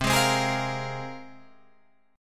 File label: C 7th Suspended 4th Sharp 5th, Guitar, Open F; C7sus4#5 strummed